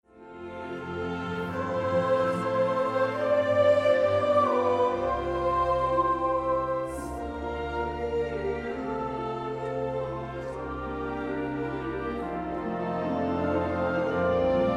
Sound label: rocking